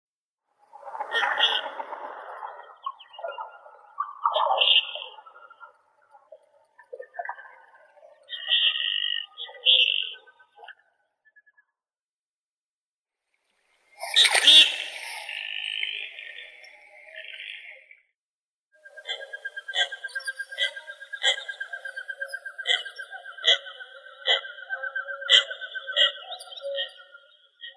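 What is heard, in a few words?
goldeneye, Bucephala clangula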